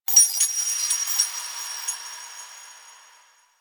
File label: Chimes Small; Warframe; Ambience; Orokin Moon